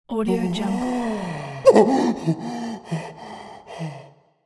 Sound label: male fear